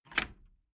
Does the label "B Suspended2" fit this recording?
No